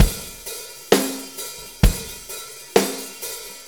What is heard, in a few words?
4-4 Loop, Drum Loops, Full Kit Loops